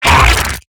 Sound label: Exertion Light; Evolve; Gorgon